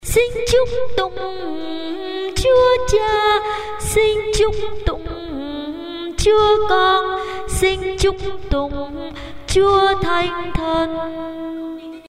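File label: soprano tenor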